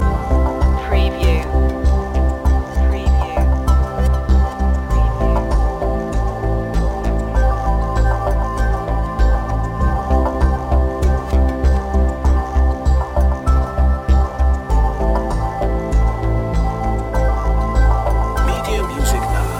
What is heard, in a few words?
digital evolution ambient bg loop bed